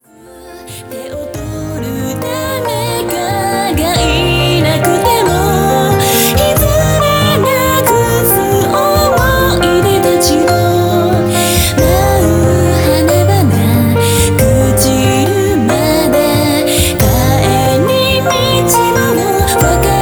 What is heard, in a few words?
waltz